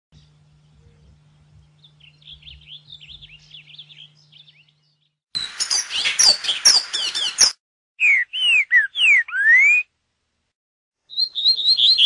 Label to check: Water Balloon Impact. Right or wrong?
Wrong